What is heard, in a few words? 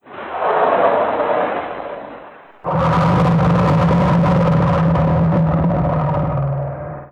trex, sf effects; sinfar haks